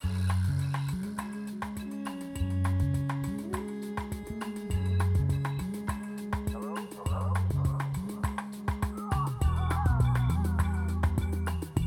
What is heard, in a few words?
Bossa Lounge; Dead Rising 2